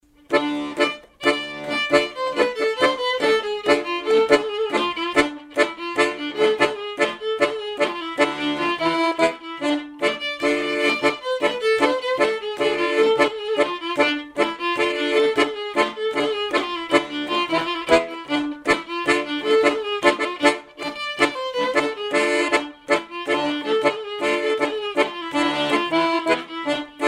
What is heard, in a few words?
Gankino horo